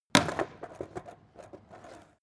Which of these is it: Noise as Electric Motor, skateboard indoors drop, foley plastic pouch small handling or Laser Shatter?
skateboard indoors drop